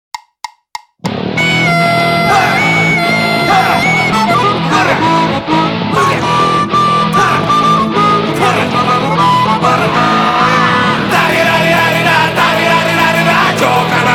Drum Minus Track

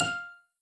Combo Sound

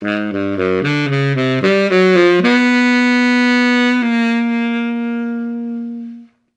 Guit; simpsons hit run; ps2

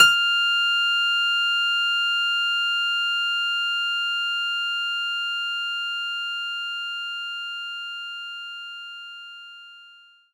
Piano F5

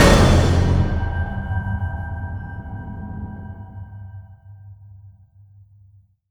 Fail

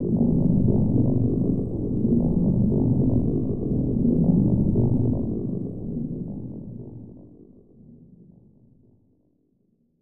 Unknown Ambience; Macross Scrambled Valkyrie; SNES

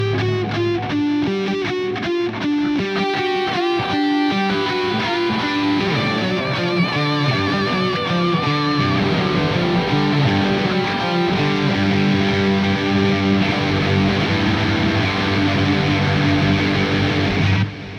Music Transition Guitar; Plants vs. Zombies Battle for Neighborville; GnomeGnight

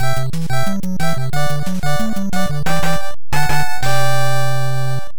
Game Start; Tecmo World Cup Soccer; NES

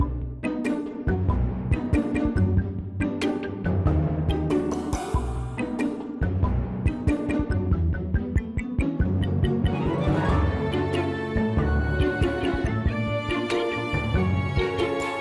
Combat Preparation Loop; Monster Life

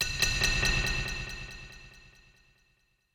Player Dying Out; Babylon's Fall

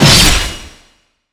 Btl Sword Critical

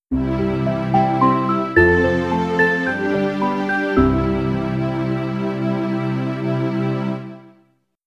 Sting

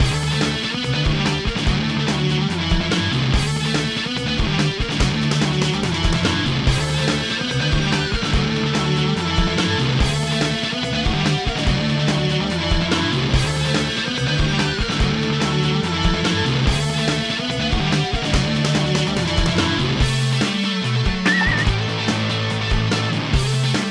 Rocksong; Robot Arena

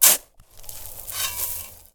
Dig

